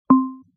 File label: click